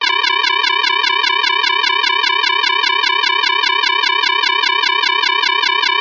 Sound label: alarm siren beeping complex warning loop; zapsplat